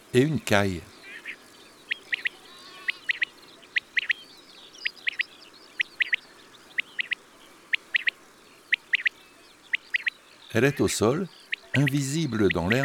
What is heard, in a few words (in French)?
Ete La Caille des bles; chants d'oiseaux